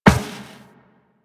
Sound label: Walk Metal Perforated, Footsteps; Warframe; Operator